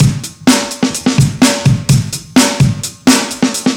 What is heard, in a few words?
127 funk break drums